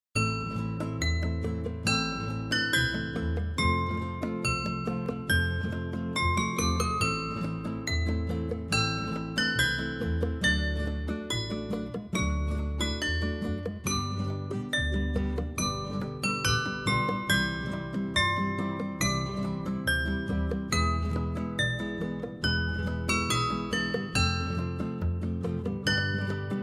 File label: Tink Tonk